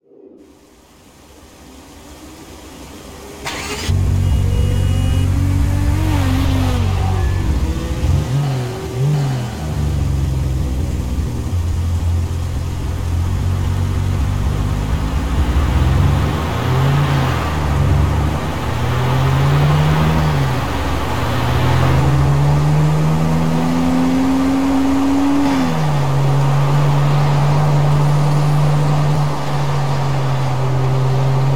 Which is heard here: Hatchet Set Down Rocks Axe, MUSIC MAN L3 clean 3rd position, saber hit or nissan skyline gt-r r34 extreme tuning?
nissan skyline gt-r r34 extreme tuning